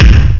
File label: bang; jetsetwilly3